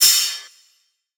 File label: Ride, Hip Hop Drums - One Shots Cymbals; Cymatics - 9God Hip Hop Sample Pack